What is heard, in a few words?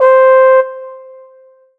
Roland SC-88 Trombone C5